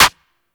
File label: Hip Hop Pack; Warframe; Bard; Bard Instrument Packs